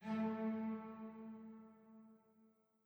chime old; chime sharp

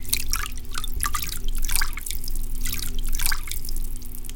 Fluid Mouvement Slow

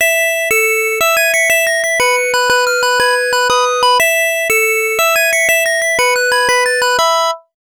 Fight Win; Robo Wres 2001; PC-8801

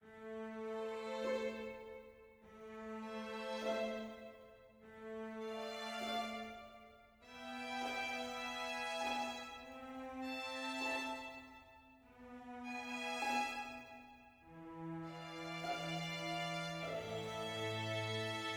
mysterious med; Quivr; PS4 Windows gamerip 2016

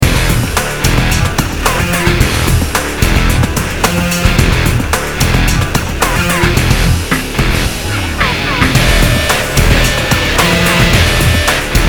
Pump Station Fight; Infernal; xbox 360

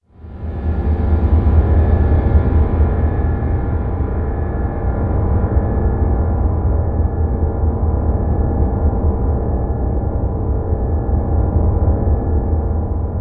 Amb; blair witch volume i rustin parr

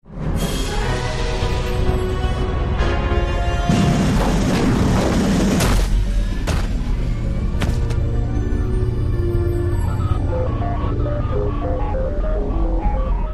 Craft Boot Right Upgrade

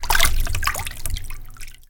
Fluid Impact Hard

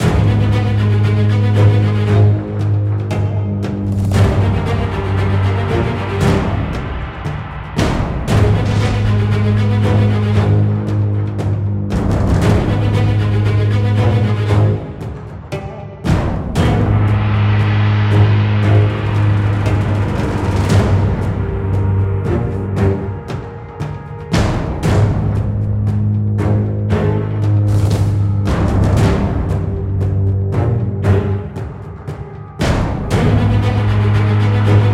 combat boss

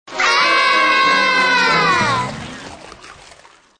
Get Eaten; The Wonder Pets Save the Sea Creatures

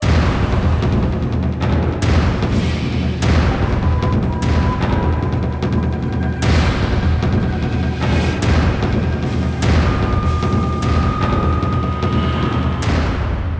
Battle; Ninja Gaiden Dragon Sword

